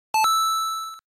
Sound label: coin